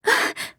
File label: gasp